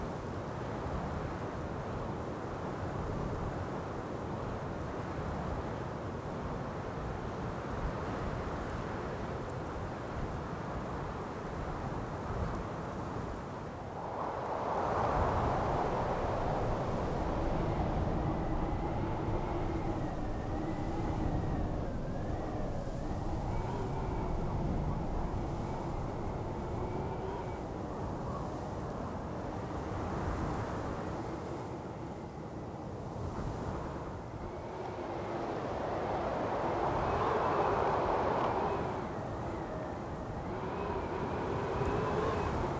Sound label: Ambience Close